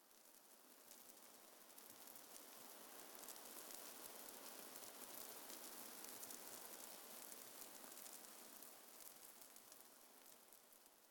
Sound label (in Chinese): 玄武岩三角洲碎石声; ambient